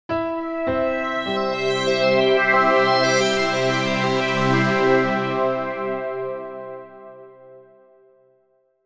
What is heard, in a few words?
multimedia signature corporate